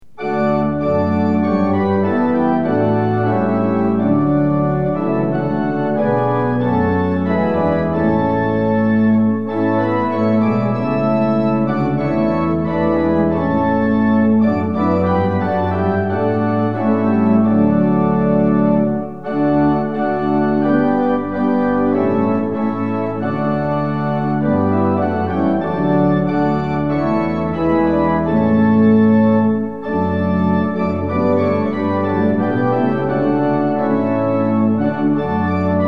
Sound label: tune; hymns; lillenas